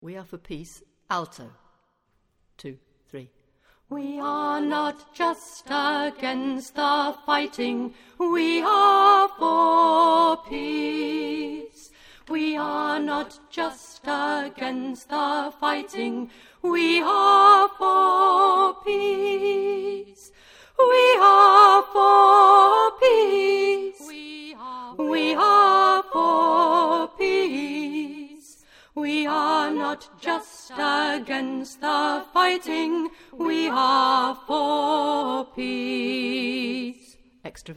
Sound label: We Are for Peace alto